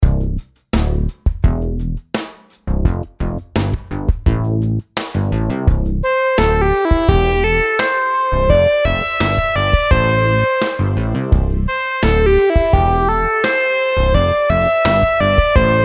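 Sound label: melodies simple 8s triplets C major; melody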